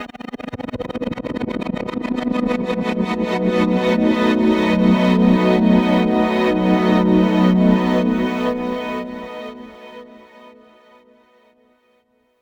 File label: strings